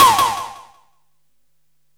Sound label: TOM DIS ECH 1 L, ELEC DRUM; USB Soundscan vol.20; Fresh Disco House I; AKAI